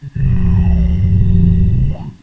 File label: roar; quake2; makron